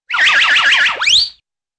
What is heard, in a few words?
whistle and zip off